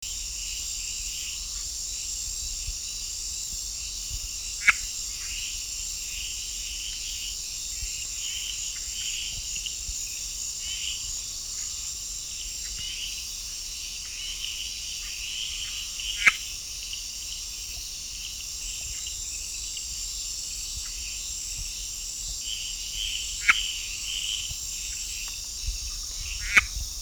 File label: Agalychnis callidryas 1 indiv